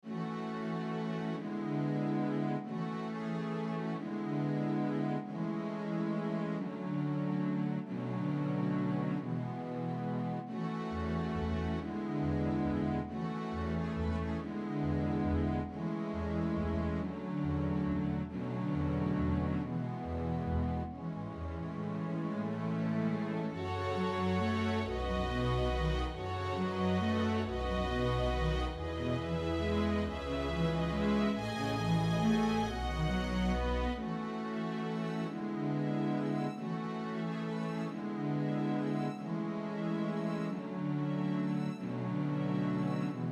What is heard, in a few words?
Valse; apple tree